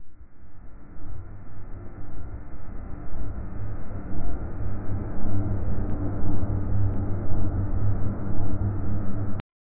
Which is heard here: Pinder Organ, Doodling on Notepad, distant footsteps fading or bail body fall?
distant footsteps fading